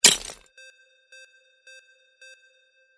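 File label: impact ice break; windy beach audio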